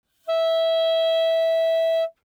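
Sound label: Eb Clarinet fortissimo E5; Woodwinds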